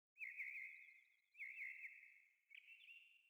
sting songbirds; Rust

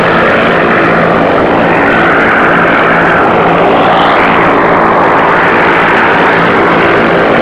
engine bomber rpm50 far; War Thunder

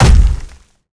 impact log heavy

